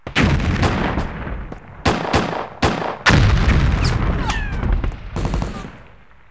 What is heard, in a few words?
Military Battle Ambience; 1,000 Sounds; Combat; Other Military Stuff